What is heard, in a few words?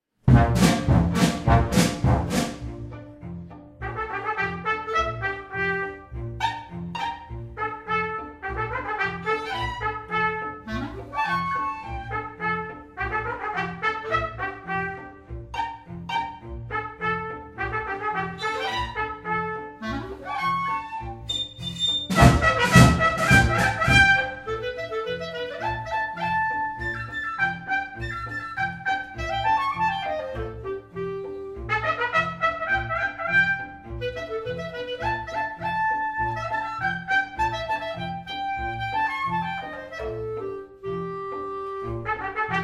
Polka